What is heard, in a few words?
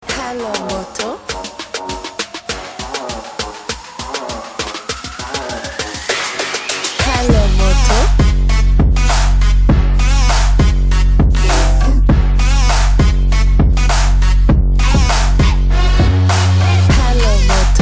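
motorola, ringtone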